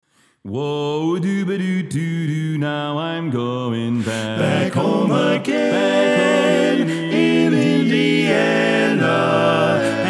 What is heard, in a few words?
Lead Left